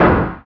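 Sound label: snare drum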